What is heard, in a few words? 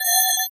Computer Button Key Swi; communications